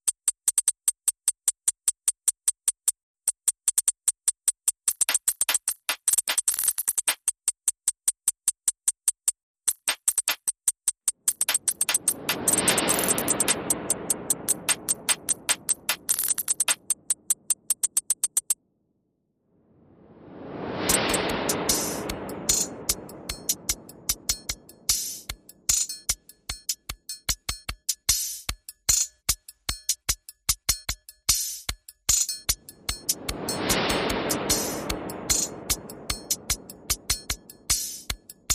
Perc and FX Only

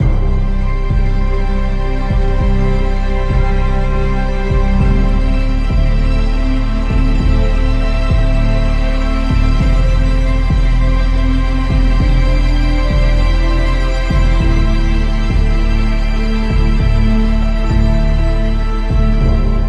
Blossom Into Beauty, No Drums No Synth

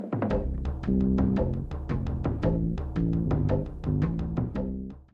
Tribal Lands

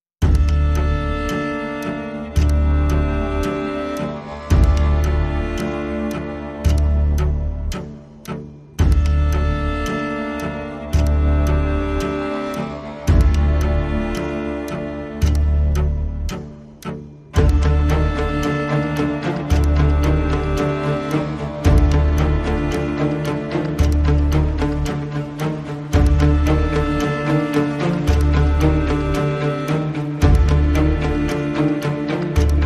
Creeping Paranoia, Less Guitars

